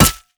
basic attacks; Dr. Mundo; TPA Mundo

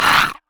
wolfman grunt; LoL; monsters

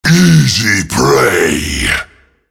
spell cast effort, en US; Rengar

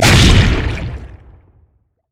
bounce large; LoL; zac base

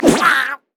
poro base jump

